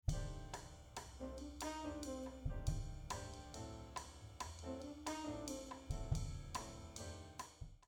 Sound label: Toms Raw